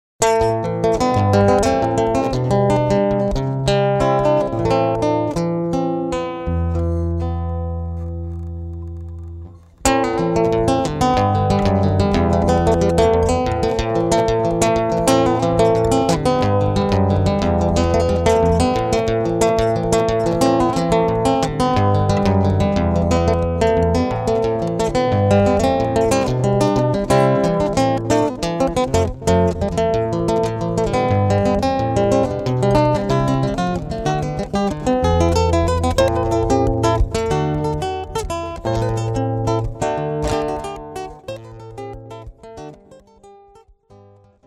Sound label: Solo Fingerstyle Guitar; Acoustic Guitar